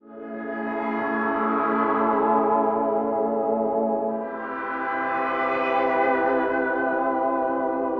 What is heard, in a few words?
Pad